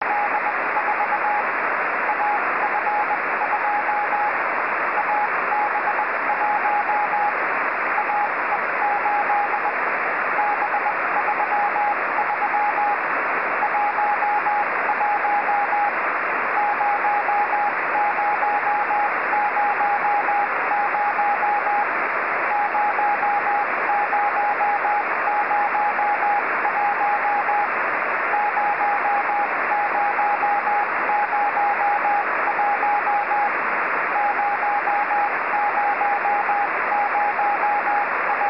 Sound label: seeds cw